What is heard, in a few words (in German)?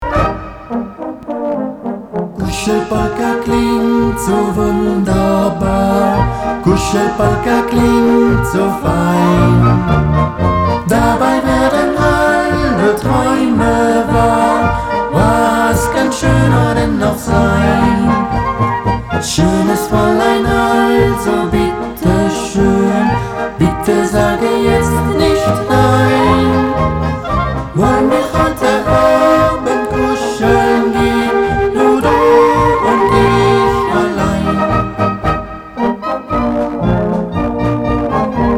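Polka, Blasmusik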